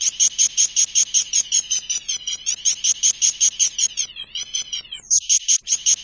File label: moving laser hats, drum loops and breaks; 141 to 160 bpm